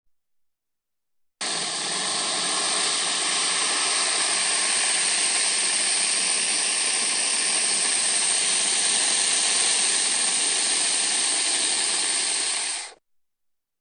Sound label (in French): python